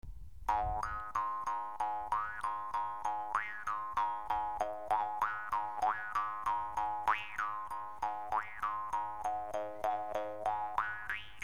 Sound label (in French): guimbarde